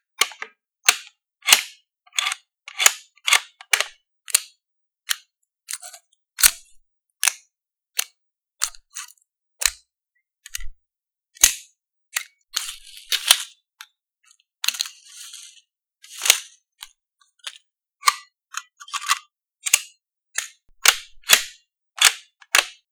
equipment clicks, handy for making reload sounds, cocking gun sounds; or sounds for assembling something; equipment clicks III; Liberated Pixel Cup